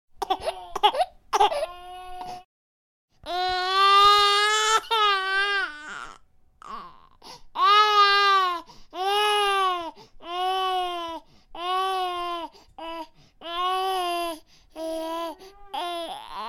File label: Baby crying, Baby whining sound effect